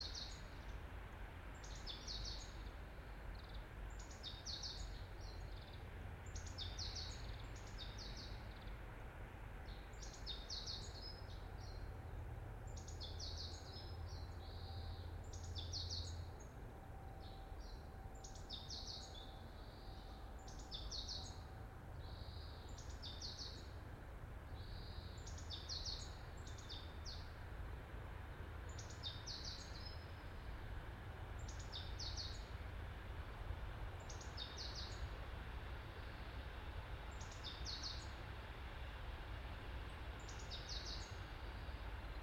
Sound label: yamanakako sound